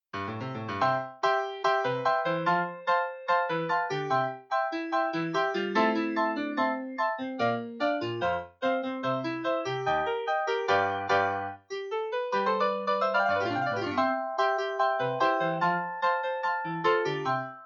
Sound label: audition accompaniment; fiona